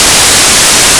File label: noise, one second duration